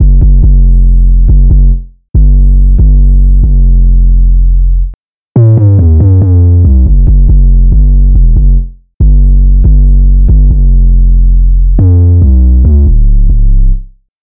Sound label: Fm 140bpm; ManOverboard